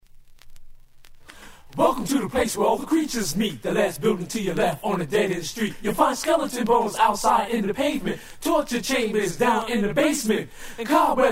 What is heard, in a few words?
Acapella